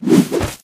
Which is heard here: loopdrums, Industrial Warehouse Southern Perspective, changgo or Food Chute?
Food Chute